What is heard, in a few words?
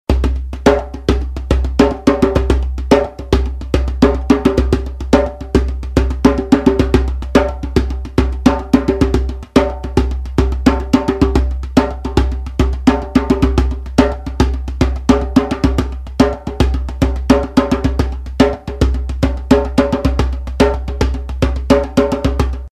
Djembe